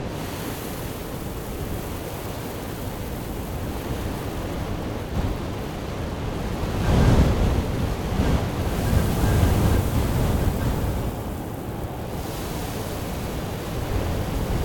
Ambience Desert; masters of anima 2018 pc gamerip; Warlord Ambience Desert

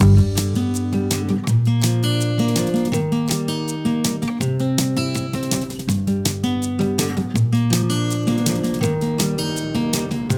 menu loop; Pix the Cat

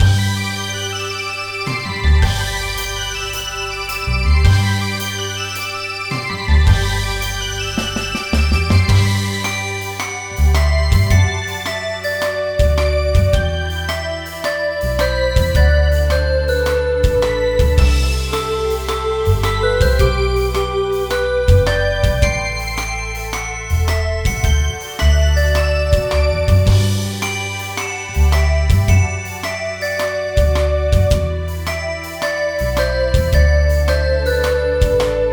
Anthem; Wol Tails; Android

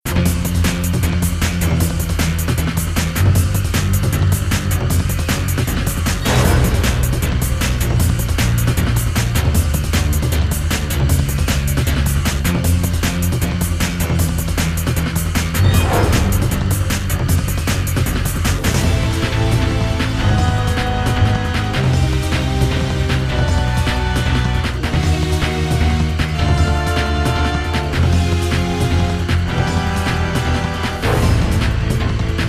Fight Loop; Crash of the Titans; PS2, PSP, Wii, Xbox 360

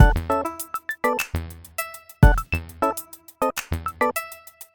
bgm bonus splash; sky chasers; lucky kat studios; android

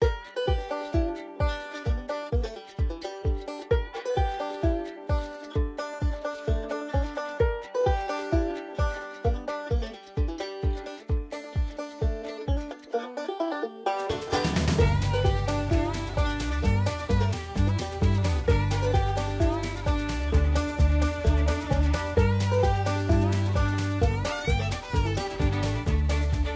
Sounds Music Turkey Game; Last Day on Earth Survival; android

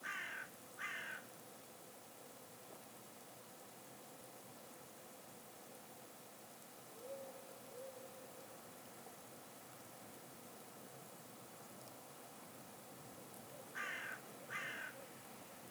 Ambience Winter Woods 88beats 140bpm

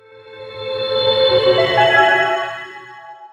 level up; razenroth linux windows gamerip 2015

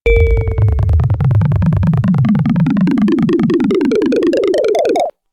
Miss

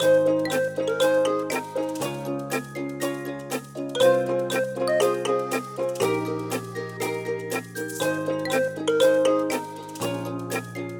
Summary music; Crowdy Farm Rush

